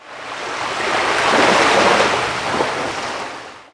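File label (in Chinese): 海浪; 红色警戒2; 环境音效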